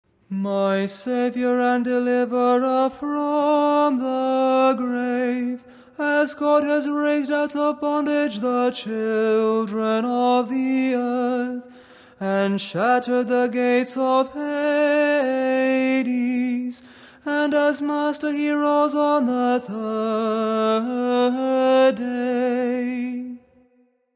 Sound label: Tone 6 kontakion